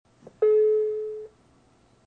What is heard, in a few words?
door chime